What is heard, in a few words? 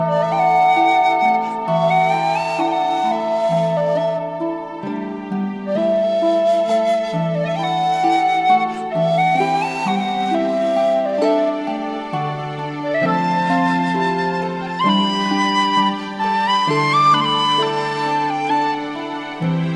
theme